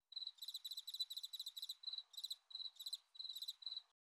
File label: crickets chirping; soundscrate